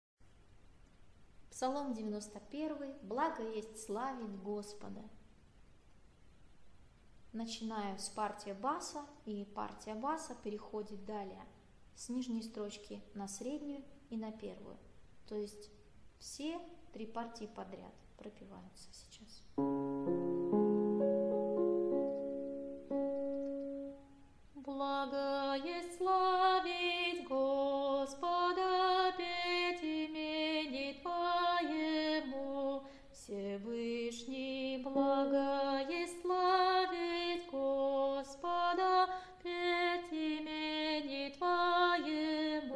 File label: bas; psalom 91